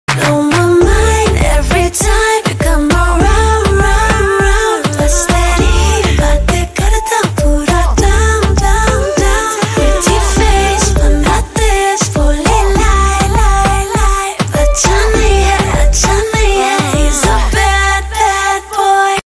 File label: rap ringtone